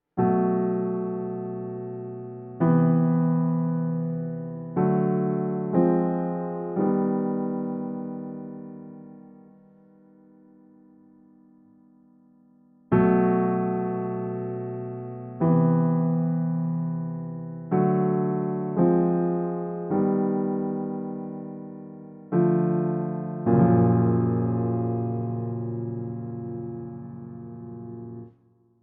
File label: polychord lower